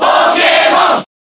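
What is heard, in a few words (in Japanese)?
Cheer Japanese; Pokemon Trainer; Brawl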